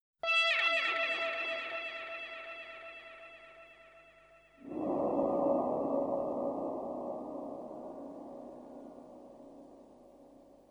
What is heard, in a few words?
Drum'N'Bass Remix